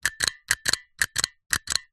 office stapler plastic press down no staples multiple times fast